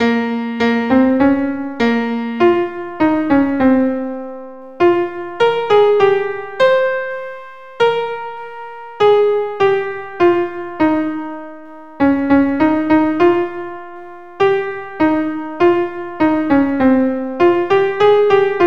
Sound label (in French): entre le boeuf et l'ane gris alto